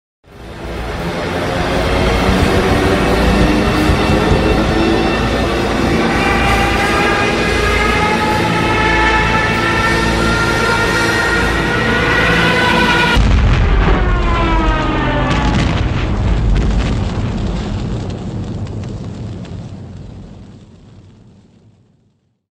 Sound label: stuka siren ringtone